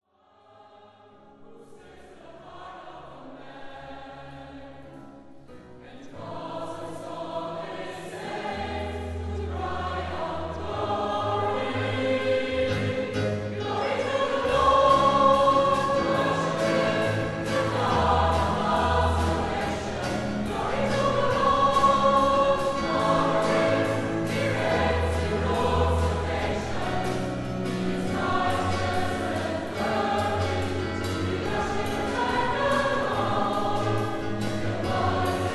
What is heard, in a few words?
Gospel Voices live